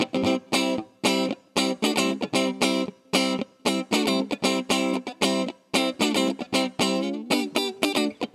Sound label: Guitar, Am 115bpm; Zero-G; Nu Disco; Construction Kits; Trunk Of Funk